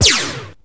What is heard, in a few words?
ROCK